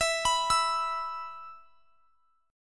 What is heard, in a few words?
E 5th E down; arpeggiated